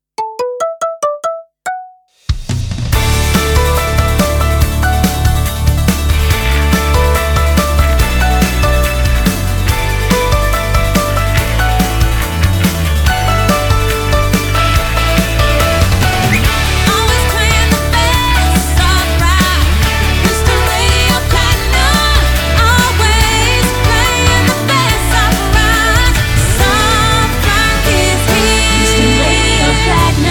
Jingle D Key 142bpm; Houston Radio Platinum

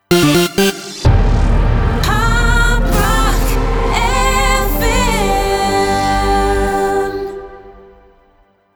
Pop Rock Jingle Sting

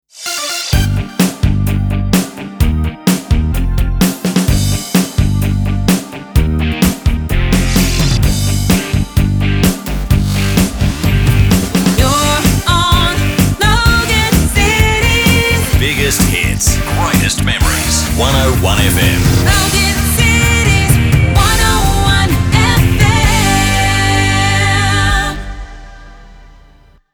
Jingle Ramp with Station Voice 128bpm Gm

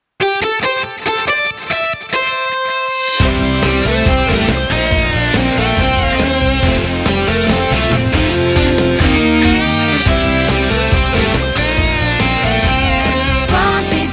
Radio Jingle Ramp; Grumpy Dingo

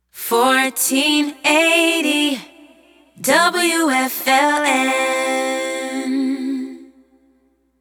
Jingle Acapella; WFLN 1480

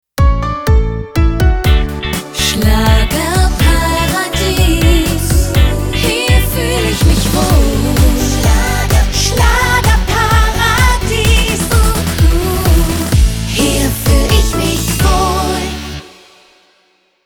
Schlager Jingle Slogan

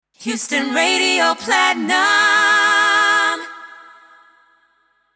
Radio Jingle 130bpm Acapella; Houston Radio Platinum

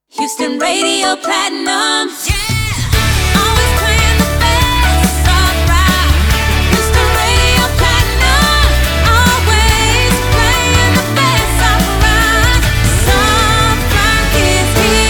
Jingle D Key 142bpm Slogan; Houston Radio Platinum

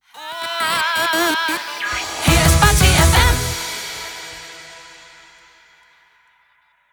Sung Jingle 85bpm E Major Sting; Batti FM

